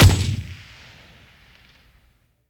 Enemy Army Helicopter Attack; rampage arcade gamerip 2018

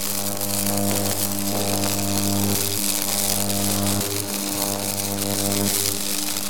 Electric Sparks Lightning Loop; Legend of Keepers

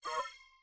aquarium boss voice Kick; enemies

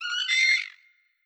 Hawk; Hawk Vo S F X1

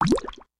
Obstacle Juicebottle Change; In Obstacle Juicebottle Change

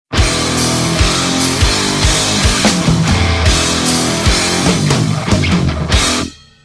Metal Fanfare; the muscle hustle; android ios

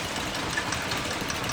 Gate Lower Loop; Hollow Knight; Mantis Lords Gate Lower Loop

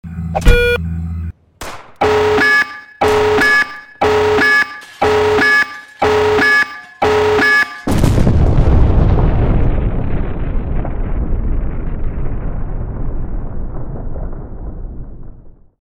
Nuke; The Henry Stickmin Collection

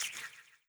Health Bug Collect

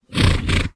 Animal Horse Snort Dry Mono

